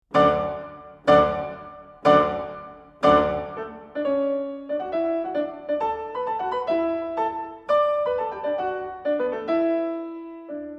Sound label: Folk Dance, piano; russian piano music